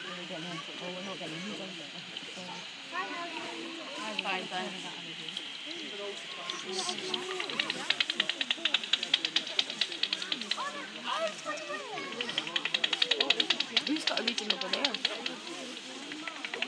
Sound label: bats and people; blackford; great turnout